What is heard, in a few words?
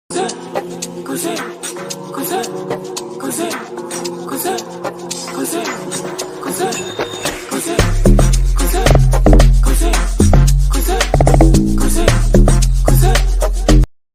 Amapiano